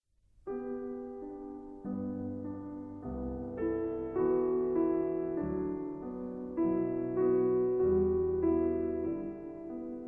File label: Pavane for the Dead Princess, piano version